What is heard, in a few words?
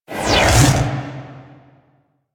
Glaive Charge; Enemies; Weapons; Warframe; Teshin; DE